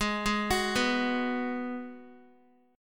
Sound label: G sharp Minor 7th G sharp, Guitar; G#m7 arpeggiated